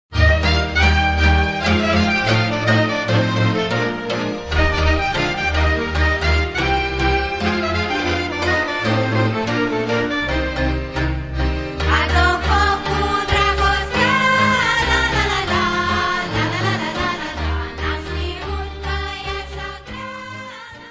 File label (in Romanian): Suita de jocuri de pe Valea Hartibaciului - Roumanie